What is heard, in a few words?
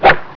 whip